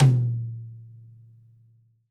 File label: TD-20 Tom A, Roland TD-20 Toms